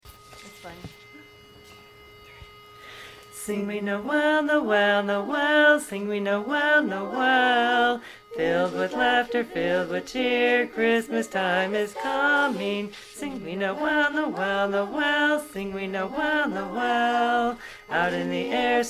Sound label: sing we noel bass